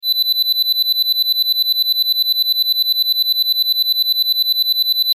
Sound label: Sci Fi Alarm Beeps Digital Technology Readout Loop; Control Panel; Blastwave